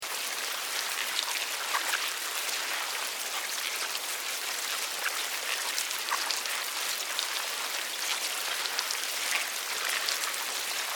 Larva Mealworms, Larva, Mealworms, Grub, Movement, Large, Group, Wet, Slimy, Insects, Bugs; Larvae; Sound Effects:Animals:Insects; Blastwave